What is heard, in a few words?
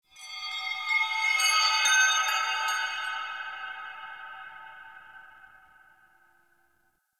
Chimes; Warframe; The New War; Narmer Outpost